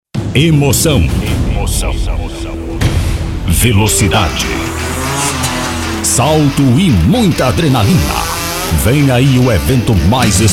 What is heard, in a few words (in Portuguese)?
VINHETA; MOTOCROSS